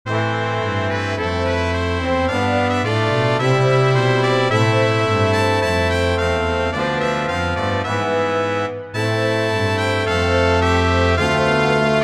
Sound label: Autumn Song Brass